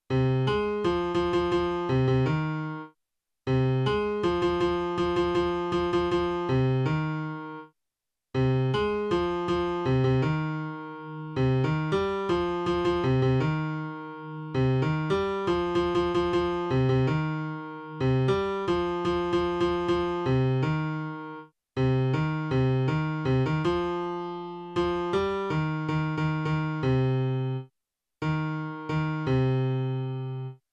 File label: piano